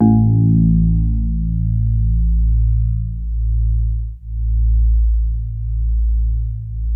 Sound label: DX7 MID1 A1, E-PIANO; Music Store